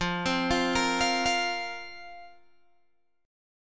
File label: F Suspended 4th F, Guitar Open G